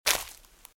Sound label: Footsteps Leaves Walk Right; RadwinIsland